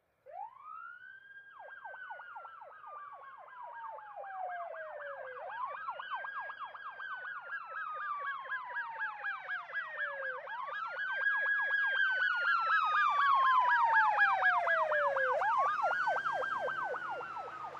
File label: Ambulance By Medium, ambulance